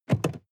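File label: sports car interior seat unlock; verkehr transport; autos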